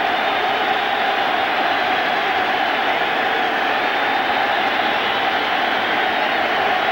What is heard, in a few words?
Baseball Crowd Cheer Loop; SFX Kit; Crowds